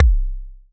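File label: kick, Casio VZ10M Drums; Polyend; Simulacrum Sounds; 1 Shots; Synths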